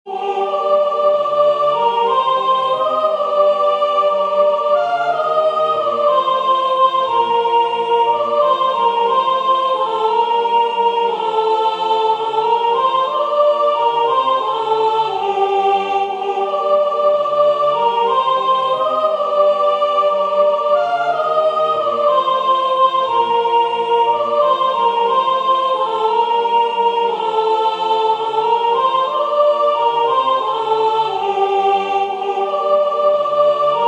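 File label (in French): soprane, Musique sacrée; Louange a Dieu; Schutz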